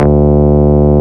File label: Bass D, Root D